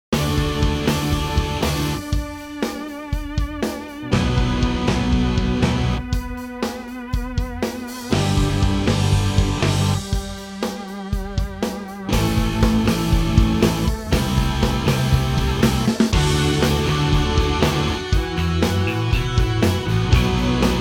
Pop Punk Instr

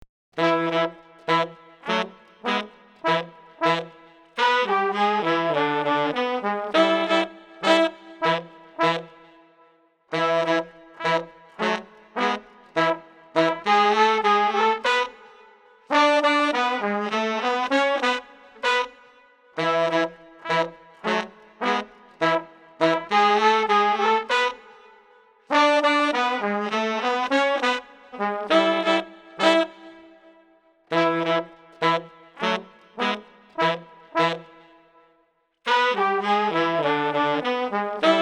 Brass Combo Cool 100

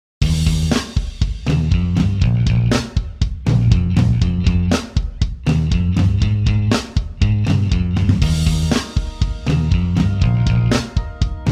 Pop Punk Instr

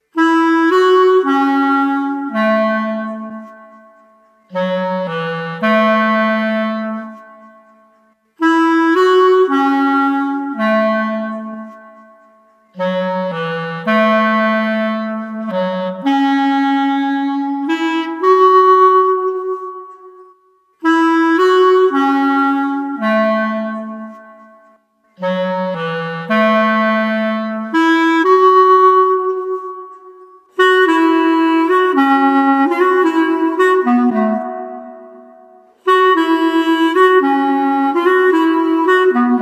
Street Clarinet Melancholy Melody 110

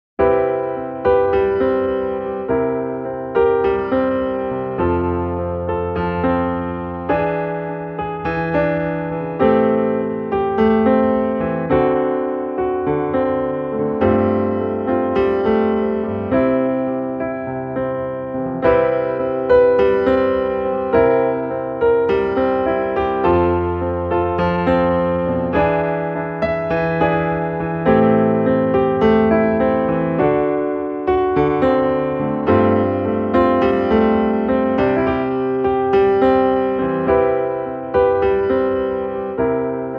Cocktail Piano

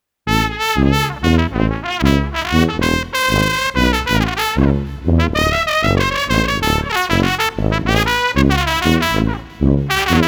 Street Brass Bouncy no Drums 95

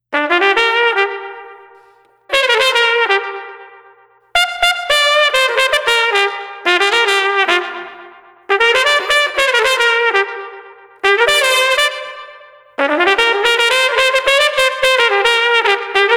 Street Trumpet Hip Melody 110

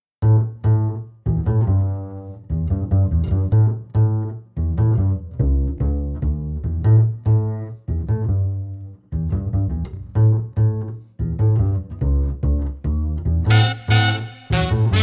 Street Bass Horns Get Moving no Drums 145

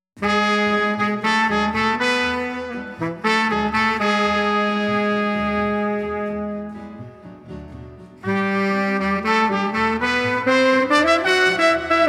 Street Brass Combo Chill no Drums 120

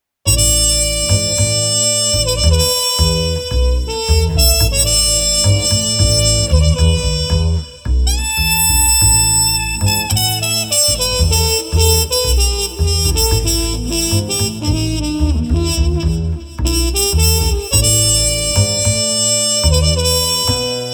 Street Trumpet Trio Jazz Groove no Drums 110

